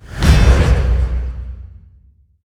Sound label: soul reaper; lilith